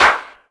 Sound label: Claps; Vengeance essential club sounds-3